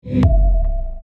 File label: logo; LMT